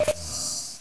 medic give shot; fgrunt

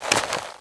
holster, weapons; cstrike

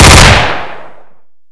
glock, weapons; brainbread

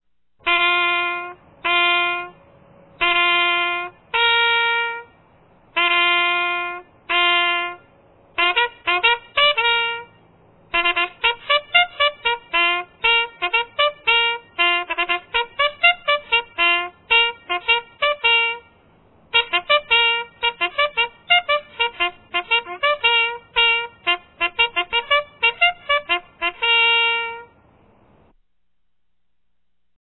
bugles; dod

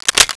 MP40 Clip in, weapons; dod

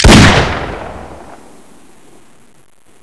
357 shoot; svencoop

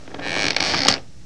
creak; svencoop; keenhalloween

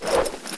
wrench miss

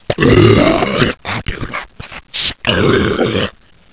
pain, zombie

